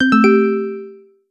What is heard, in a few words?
Contact Logs In